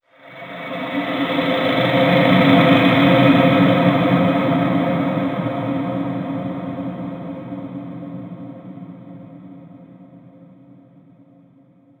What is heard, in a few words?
environment graveyard stinger ethereal